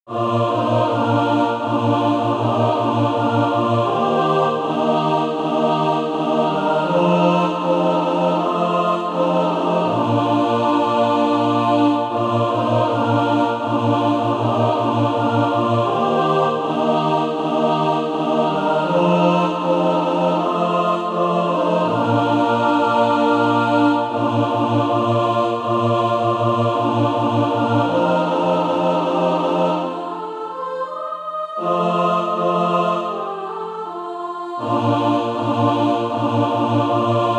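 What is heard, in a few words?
mixed, SATB Practise Music; Christ Is Ready to Welcome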